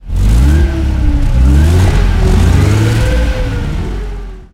centurion engine load, tanks; engines; War Thunder

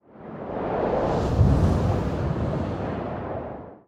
ambient battle; World Of Warships

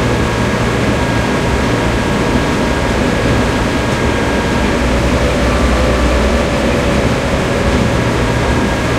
panzer6 engine int rpm, engines tanks; War Thunder

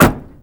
van door close; favela; Call of Duty Modern Warfare

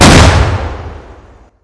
Vehicle Weapons; Red Orchestra Ostfront 41-45

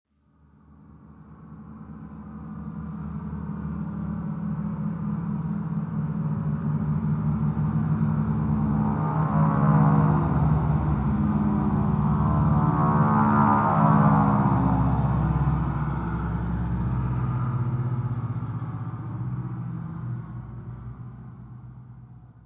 airfield hangar passby; War Thunder

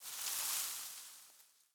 leaf rustle grassy hard

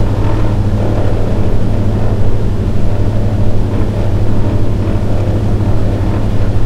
c46 cockpit r, engines; War Thunder

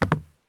landing wood; Escape from Tarkov

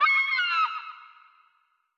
ambient port; World Of Warships; port3d

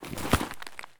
prone concrete; Escape from Tarkov

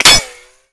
impact metal; Modern Warfare

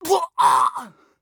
hit; Escape from Tarkov; scav1

